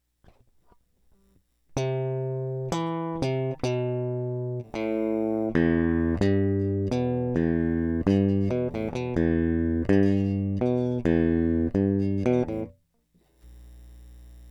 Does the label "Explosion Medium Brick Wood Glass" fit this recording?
No